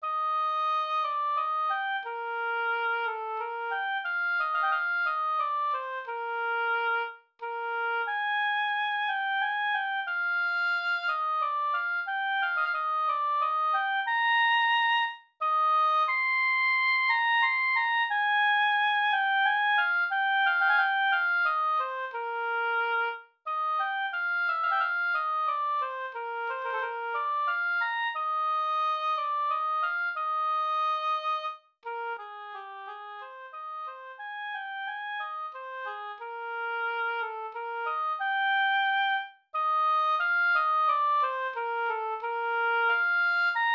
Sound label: oboe